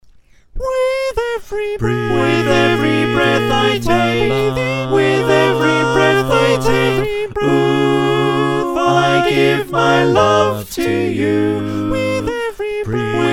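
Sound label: soprano alto tenor bass, choir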